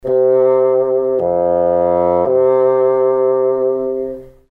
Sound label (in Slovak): fagot